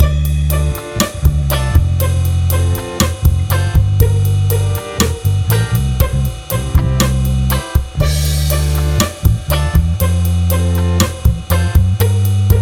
Laid Back Loop

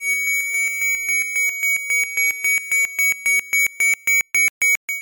Beep Beep Beep Beep Beep Beep

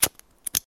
Lighter

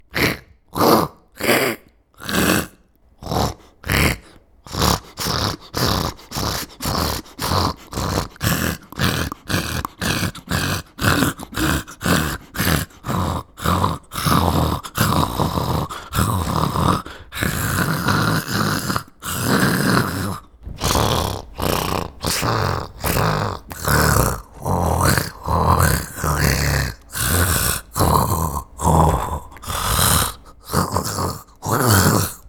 Rat Growl Hiss

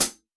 hihats 14 top 15 bottom foot pedal close chick; Skiba custom hihats

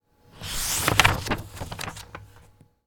Page Flip Page Turn

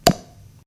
Flopp Juicy

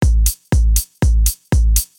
Acid house 120 Beat n hat

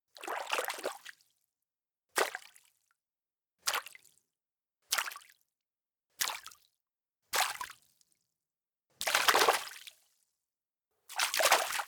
Splashes

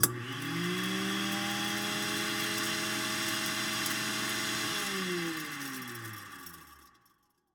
Household Disposal

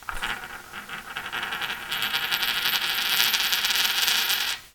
Coin Spin

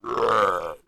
Monster Sound Medium Death

